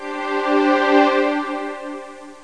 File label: Strings; Soldiers 2 68k v1.1